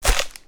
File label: Lunge Hit